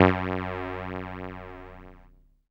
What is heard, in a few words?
SIEL, String Machines